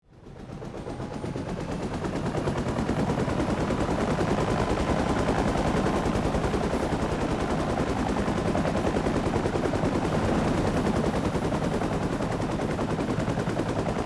Helicopter engines turning on firing up chopper start